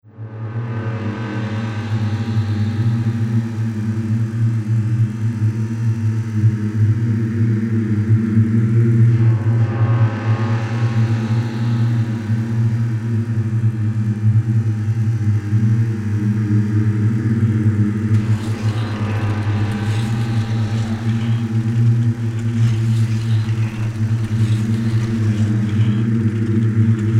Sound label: Haunted Brass Tubular; Noisebiscuits